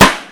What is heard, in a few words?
snare